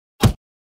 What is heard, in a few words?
Punch hit hard